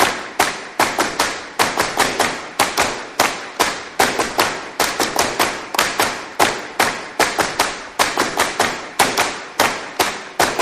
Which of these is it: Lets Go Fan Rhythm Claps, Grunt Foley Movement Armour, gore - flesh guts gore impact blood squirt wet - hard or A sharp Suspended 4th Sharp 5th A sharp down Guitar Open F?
Lets Go Fan Rhythm Claps